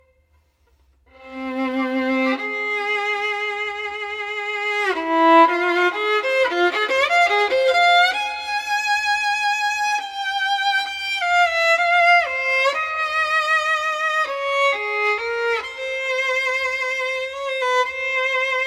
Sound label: Violin; Ikuto